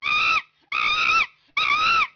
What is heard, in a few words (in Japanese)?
saru; nakigoe